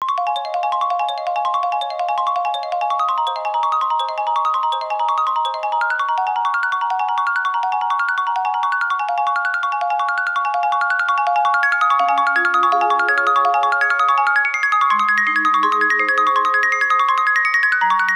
Mallet Alarm (Sunrise); Sony Ericsson; Xperia X1; PlusFourSix; Venus; Lars Edvall

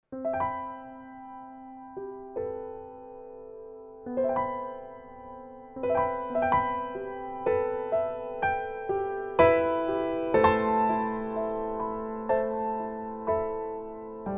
chords, ringtones; Verykool; S757

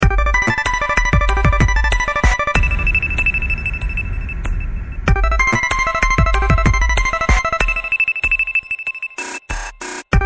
Ring Magic Hour, phonetones; LG; A310

